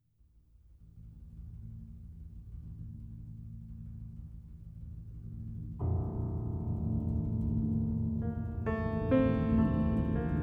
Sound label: Piano, Oil Drum, Soprano Sax